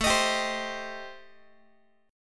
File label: A-Minor 7th Flat 5th, Guitar, chord; strummed; JGuitar